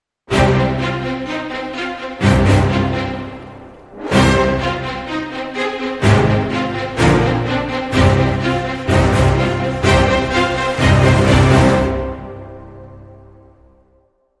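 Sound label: Hybrid Sting, Orchestra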